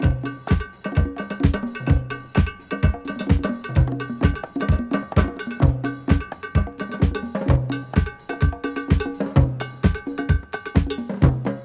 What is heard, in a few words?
129 bpm fresh drum beat e key